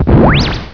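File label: missile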